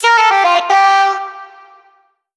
Vocal Sample Pack Phrases Gm; Slate Digital Omnivox Vocal Sample Pack

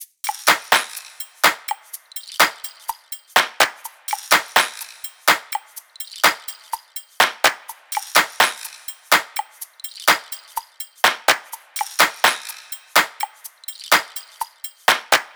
top loop, Drums, Loops, Top Loops, Pop; lakeview 125; Slate; SD PASADENA Pop Sample Pack

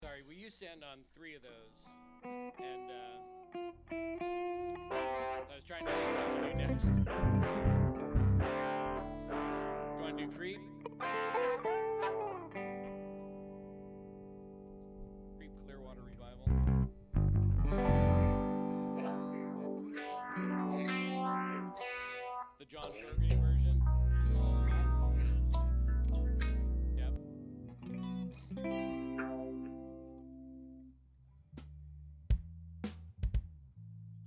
Singalong Mix

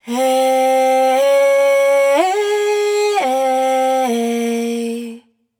riff 115 C# wet, Vocal; Slate Digital Omnivox Vocal Sample Pack

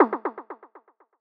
perc pingpong, Hip-Hop Drum One Shots; SD CAICOS Hip-Hop Sample Pack; Slate

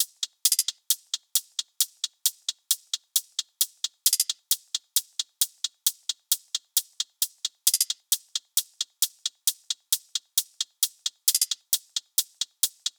hats 133, Hat Loops; drum sample pack; Adatara; SEISMIC; Slate

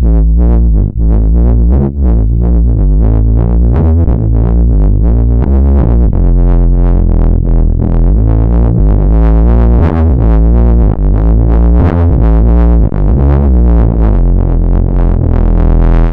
Synth loop 119 Dm, Synth Bass Loops; Slate Digital BASSHOLE Sample Pack

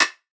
perc wide load; Drum One Shots; SD MIDNIGHT Sample Pack; Slate

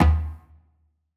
percussion oneshot arrow; Pop; Drums; SD PASADENA Pop Sample Pack; Slate

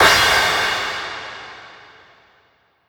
Cymbal Thunder, 909 Cymbals; Slate; Vintage Drum Machines Volume 3 909 Edition

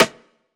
snare oneshot; Pop; Drums; SD PASADENA Pop Sample Pack; Slate

